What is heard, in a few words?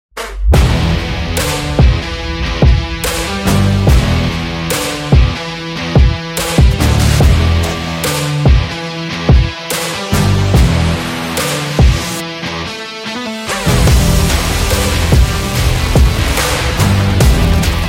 Rock Hip Hop